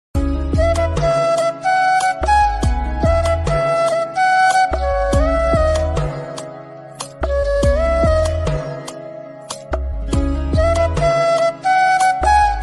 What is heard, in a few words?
bansuri flute ringtone flute ringtone; mobile ringtone